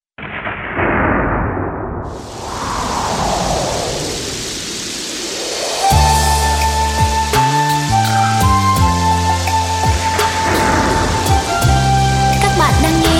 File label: beat, playback